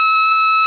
Skrik Scream Loop

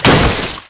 Pirate Barrel; rabbids multiverse; iOS, macOS, Mobile

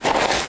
Sand footstep; starbites

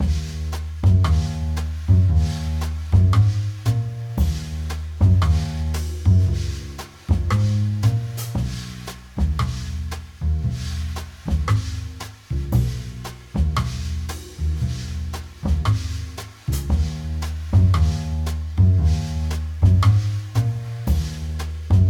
Drums Bass - 115bpm

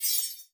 Card Pack; rabbids multiverse; iOS, macOS, Mobile

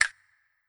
Crab Castanet

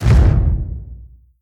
Monster Foley Footstep; Monster Generic Foley Footstep; gamerip

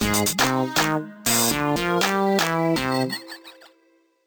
Transition

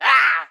Attack; Pecheneg Gray; Folk Hero

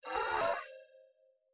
aquarium boss voice Intro; unsighted; enemies

